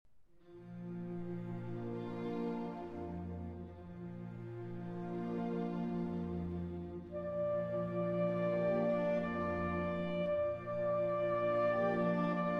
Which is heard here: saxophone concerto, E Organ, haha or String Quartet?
saxophone concerto